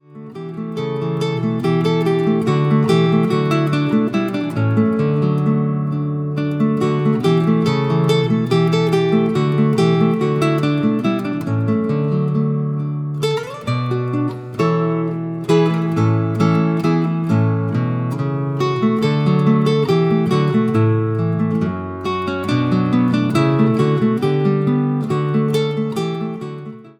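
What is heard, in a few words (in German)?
Fröhlich soll mein Herze springen, Gitarrenbuch; Paul Gerhardt Gitarrenbuch mit CD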